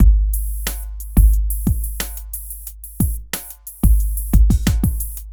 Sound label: Beat, Hip-Hop